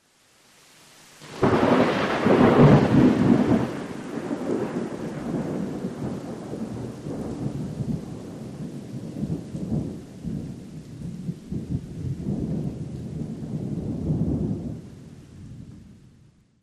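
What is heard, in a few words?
Thunder Crack Rolling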